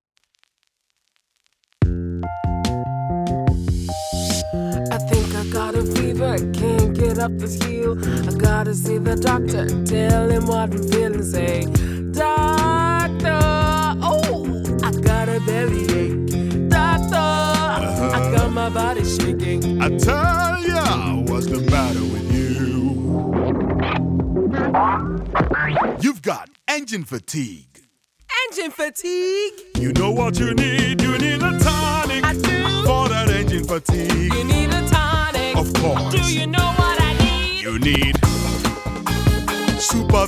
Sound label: SuperViscoStatic Jingle; Forte Oil